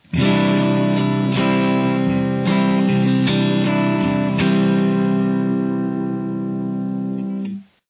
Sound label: C add9